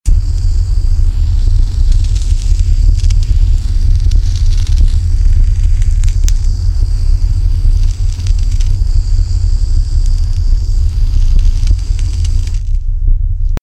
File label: JET FIRE